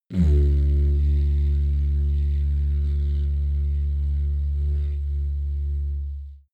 didgeridoo soft tone

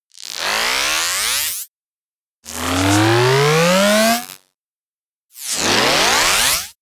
charge

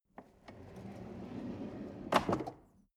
drawer medium close draw

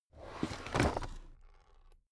drawer cabinet stuff open regular

